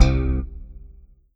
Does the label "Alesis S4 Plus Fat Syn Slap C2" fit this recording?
Yes